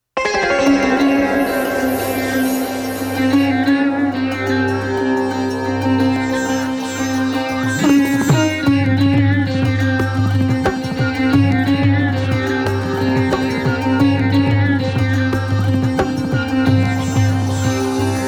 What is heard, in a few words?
Chilli Lounge MUSIC; iProVid; covid